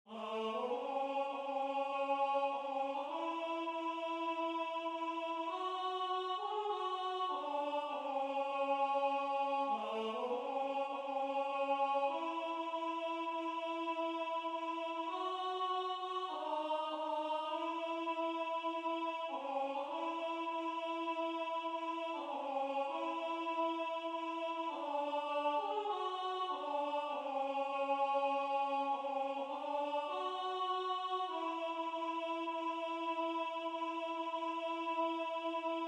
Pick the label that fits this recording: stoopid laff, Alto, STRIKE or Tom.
Alto